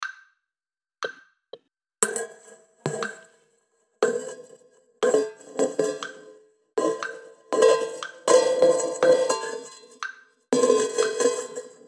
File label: Backing Click